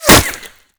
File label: impact masonry; Modern Warfare